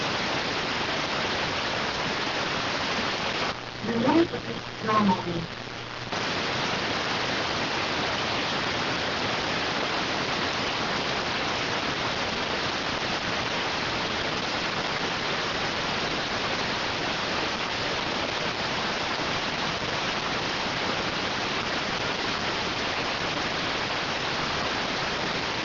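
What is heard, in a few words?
radioteletype receive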